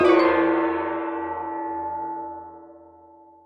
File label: minigong